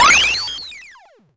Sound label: portal; movement